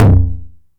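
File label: 909 high tom tape saturation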